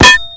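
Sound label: metal pipe burst; Call of Duty Modern Warfare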